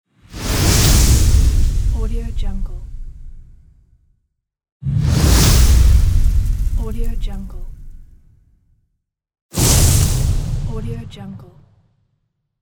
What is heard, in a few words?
fire spell